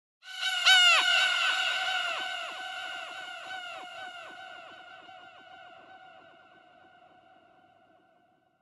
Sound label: Gull Eerie Croak - Pass By; Hollywood Edge; sound designer's toolkit v2